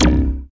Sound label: hero alien disc throw